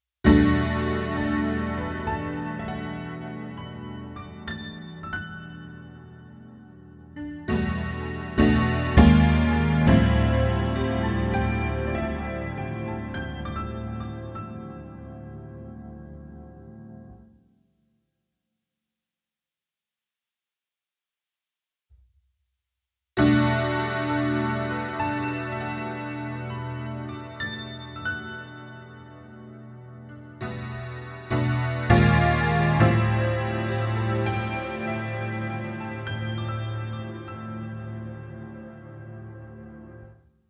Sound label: Piano V2 vs V3 Glass Piano; Arturia V Collection 9